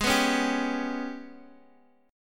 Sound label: Ab Minor Major 7th Double Flat 5th, Guitar, Open D; down